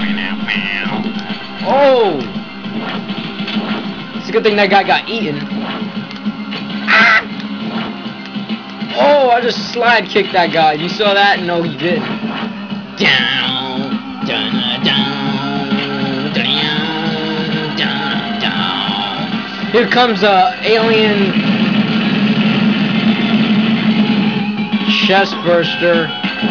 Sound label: Chest Busted